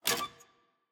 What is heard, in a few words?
Monkey Jaw Latch; Puzzle